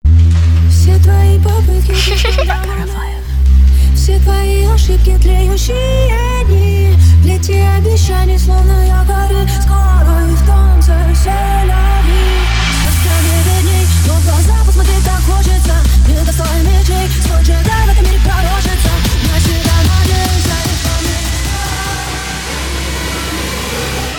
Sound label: Hardstyle Remix